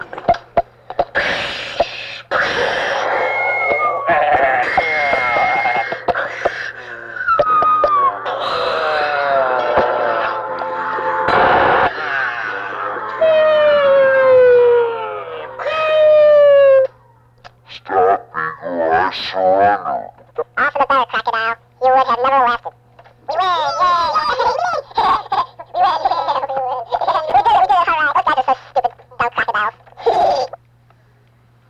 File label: win